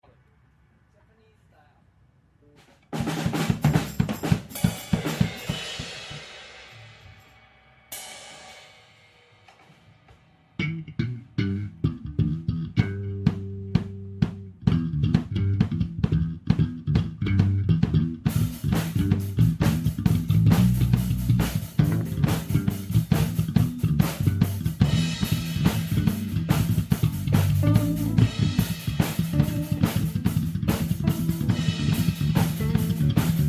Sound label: Thrash Funk